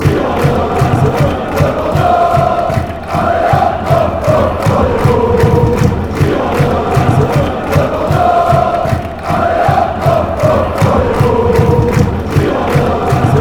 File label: girondins allez